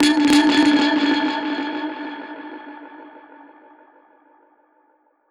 Perc Hit, dub percussion

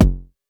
Tech bd, Jomox Airbase99; Drum Machines

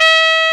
FTR A4, trumpets; East West - Global Sounds

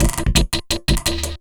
LOOP, Drum & Bass, 170 BPM

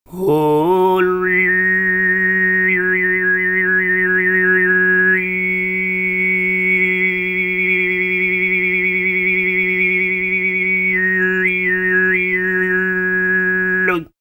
FREE, TUVAN MID

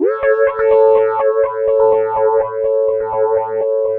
Analog Rhythm

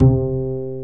BASS C4; ORCH.COMP

